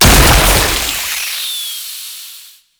Infested Survival Purification Machine Explosion; Warframe; Nineteeen Ninety Nine

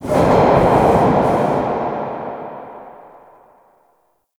Subway Misc; Nineteen Ninety Nine

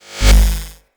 UI Enter

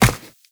Run Ice, footsteps; Warframe; Characters; Tenno

